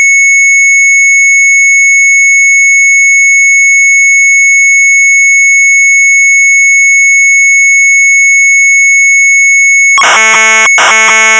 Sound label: MZ800 Tape, sharp; GALGJE V.2; retromelsarchive